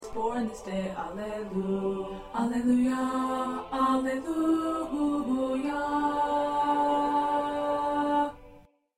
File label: 1st Tenor